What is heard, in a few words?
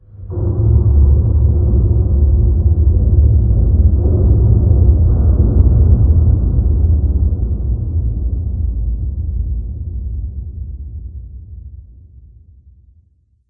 amb boomer; video games; ambient; random abstract; source engine; portal2